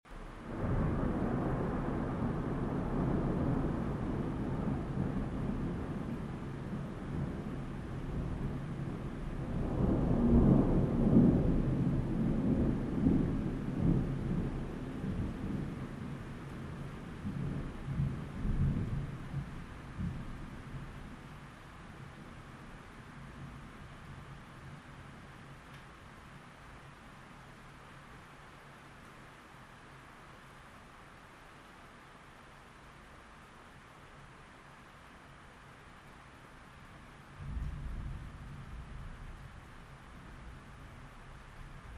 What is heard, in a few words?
nighttime rain and thunder weather stereo soundscape